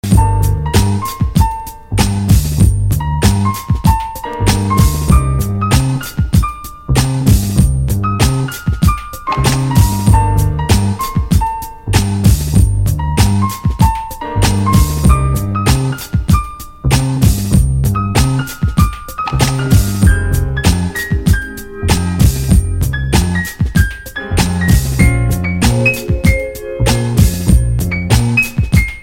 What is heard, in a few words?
Tango Piano; длительность 0:29